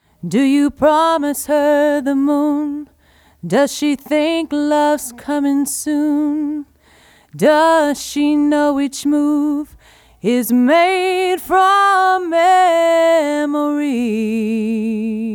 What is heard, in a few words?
vocal mud bad good; audio examples from the book